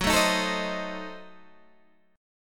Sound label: Gb Diminished 7th Guitar Open G